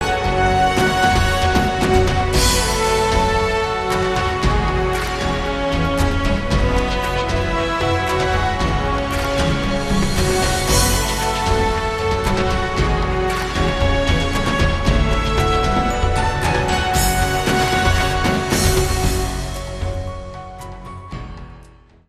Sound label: perc